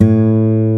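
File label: A 1 MARTIN, acoustic guitar; AKAI S6000 CD-ROM - Volume 1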